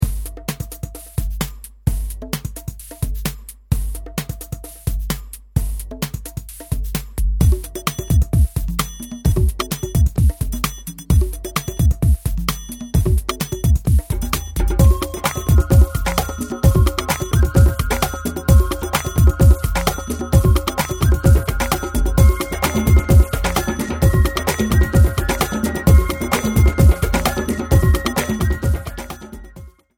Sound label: celtic scramble